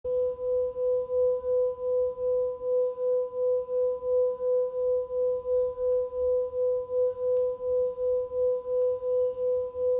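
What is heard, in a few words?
Mantra Gold Hammered B, singing bowl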